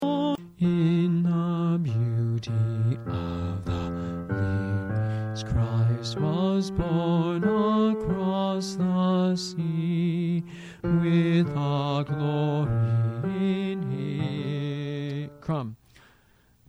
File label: Bass II; Battle Hymn; District II 2003; Mixed Choir Practice